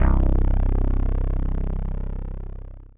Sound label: 505, String Machines